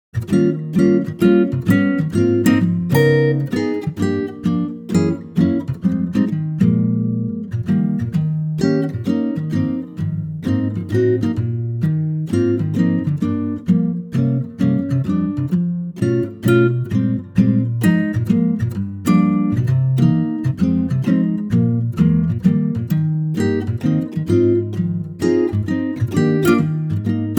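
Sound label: acoustic guitar Eb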